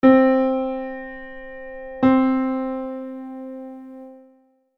unisono

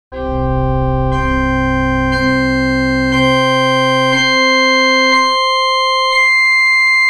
spirale delle ottave

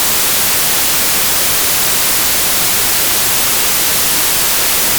rumore bianco

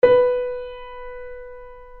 suono

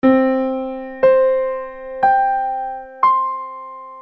progressione delle prime quattro armoniche

